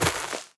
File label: step light, footsteps player grass